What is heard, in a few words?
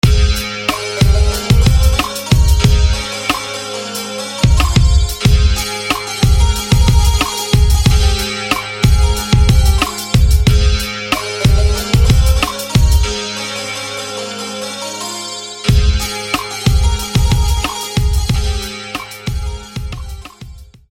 Twin Santoor